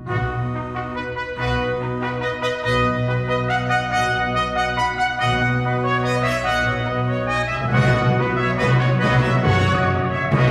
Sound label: trumphet; Moravische